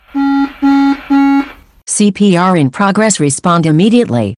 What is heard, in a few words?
CPR Alert Tone